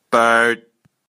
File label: bird